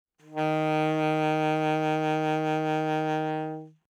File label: Alto Sax vib ff Eb3, Eb Alto Saxophone; Woodwinds; MIS Pitches - 2014